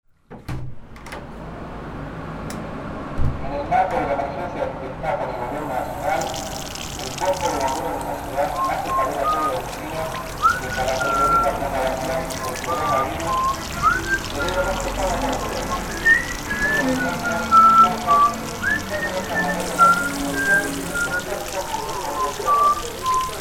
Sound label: A New Soundscape